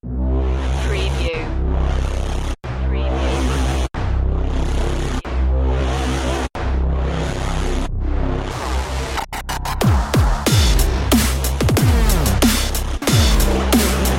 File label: dubstep